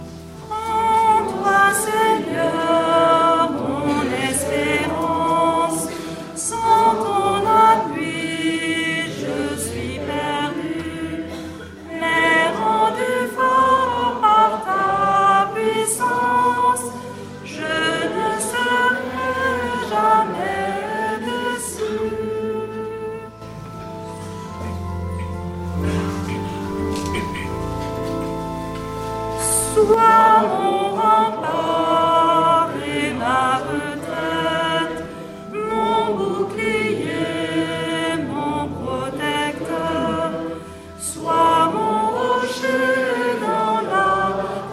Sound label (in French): chant entree